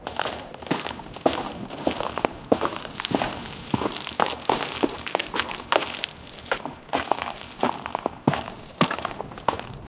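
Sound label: heavy leather boots walking, cloth rustling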